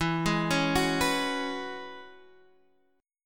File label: E 6th Add 9th E down Guitar Half Step Down; E6add9